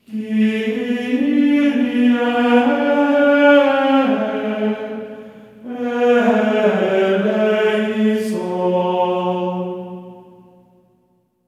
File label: KYRIE B4, voices; Spectrasonics; Symphony of Voices; GR KYRIE